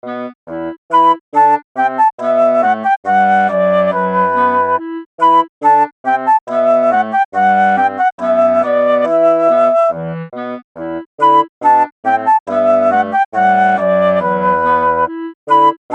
classical woodwinds

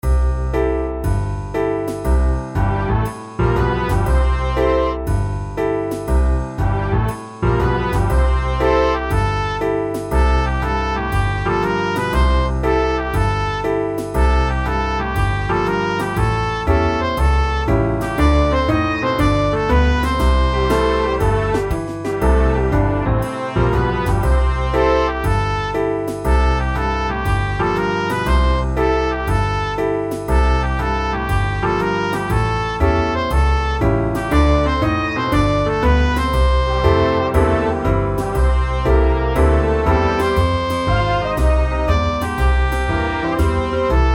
Bluesy

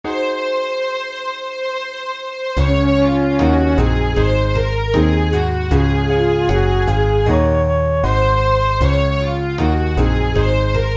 show tune

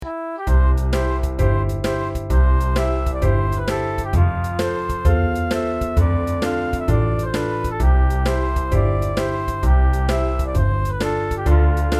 lively

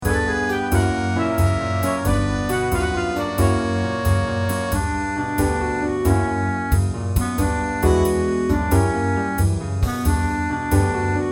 jazzy blues

